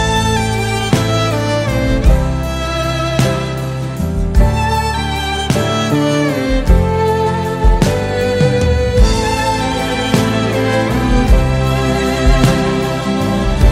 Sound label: ringtone, bollywood